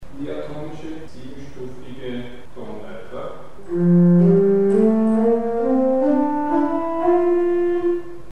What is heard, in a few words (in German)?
diatonisch; Mikrobuch